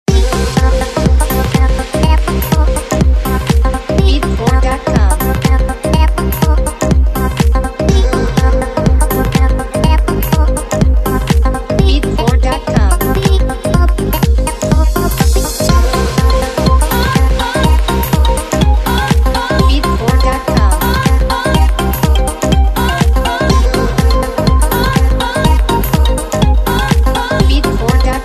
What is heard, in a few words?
summer rhythm